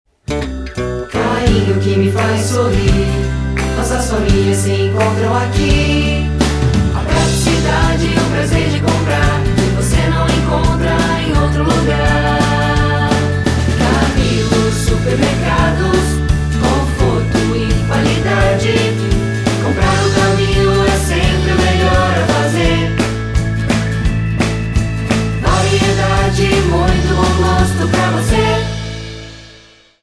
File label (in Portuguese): Jingle